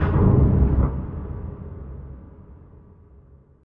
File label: Metal; In the Line of Duty Firefighter